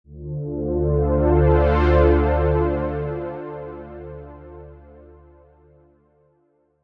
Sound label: synth chorus classic